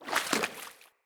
Corrupted Battle Dog Walk Water Step, Foley Footsteps; Animals